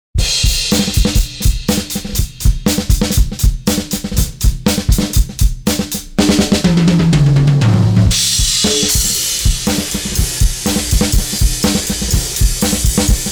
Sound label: EQ Tone Stack High boost; Kirchhoff